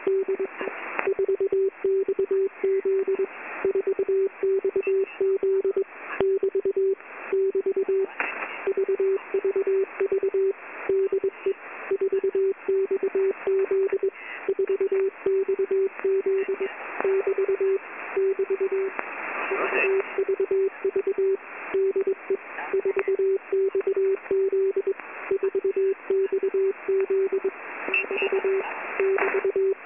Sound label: Short Wave Radio Noise